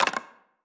Kanpai Plastic

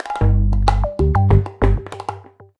Jingle Start; Super Rub 'a' Dub; PS3

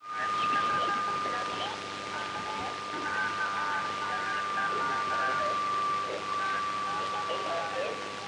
Radio; Music Tunnel Section; subway surfers city sound effects

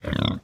Random Grunz, Pigs; Aporkalypse Pigs of Doom

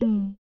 Slime Vox Big

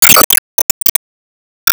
Enemy Hourgrass Active Loop

